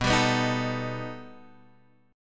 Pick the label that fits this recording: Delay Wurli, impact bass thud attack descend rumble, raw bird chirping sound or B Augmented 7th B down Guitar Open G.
B Augmented 7th B down Guitar Open G